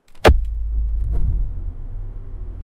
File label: of somebody who falls on, fall